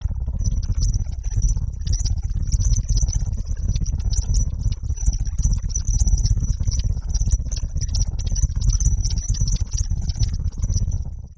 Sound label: energy beam